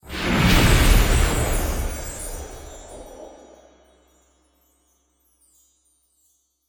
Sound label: mega Win Attention; excalibur